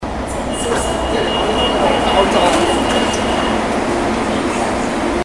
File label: Open, Faiveley Transport, Railway